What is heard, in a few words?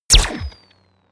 turn on off